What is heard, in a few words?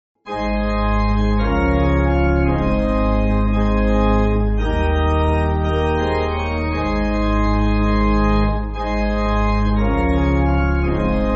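Pipe, Music Backing Files for Churches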